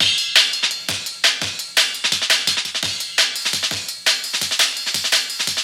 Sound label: hybrid analog speak loop higher